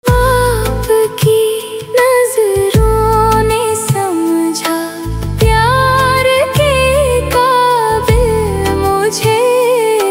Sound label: AI Ringtone